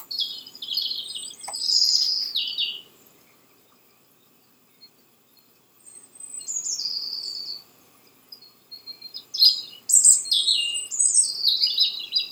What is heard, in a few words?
birds